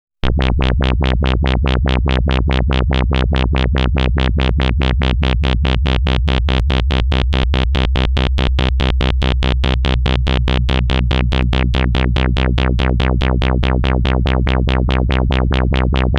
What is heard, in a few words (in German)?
Dreadbox Polyend Medusa LFO Schwingungsformen